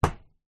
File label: footstep single metal; foley; zapsplat